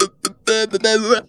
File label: Funky Vocals; USB Soundscan vol.18; AKAI